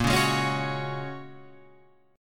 Bb Minor 7th Sharp 5th, Guitar, Open F; Bbm7#5 strummed

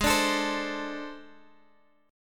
A Minor Major 7th Double Flat 5th

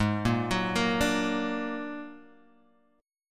A sharp Augmented 7th G sharp, guitar

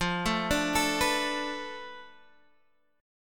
B Minor 7th Flat 5th F, guitar chord

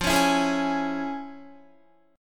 F Minor Major 7th Sharp 5th Guitar; FmM7#5; strummed; 13,16,14,13,13,15

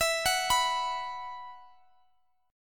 E Suspended 2nd, Guitar Open G

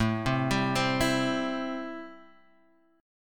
A-Minor 7th A; x,x,x,2,0,2,2,1; Am7 arpeggiated; JGuitar

